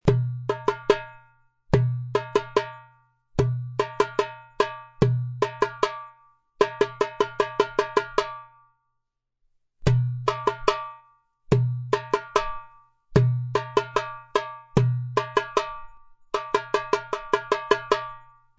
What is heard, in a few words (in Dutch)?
ritmisch thema